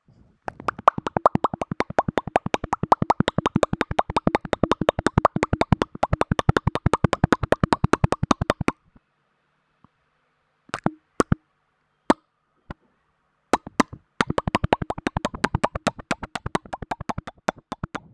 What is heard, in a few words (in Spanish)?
Voz tenor labial f